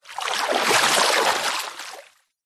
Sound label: nature water movement surface splash light hand; zapsplat